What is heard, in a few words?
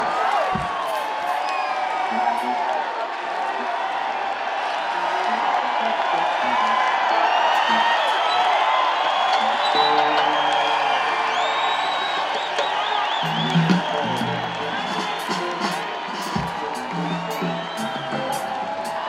Crowd